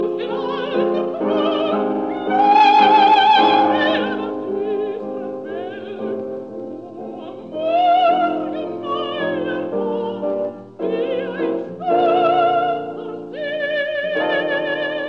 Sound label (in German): Gedichte fur eine Frauenstimme; Wesendonck Lieder; Schmerzen